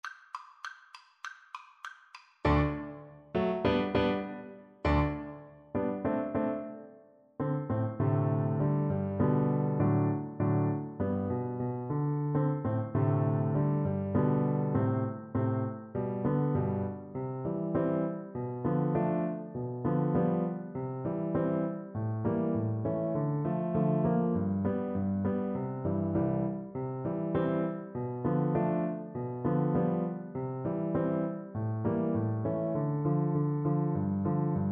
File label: pizzicato polka, Viola